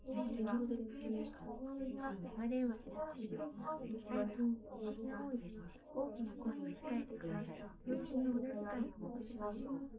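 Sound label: female, live recording 250ms 5cm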